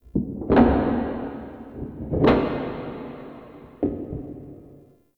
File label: Freaky Taps; Warframe; Shadowgrapher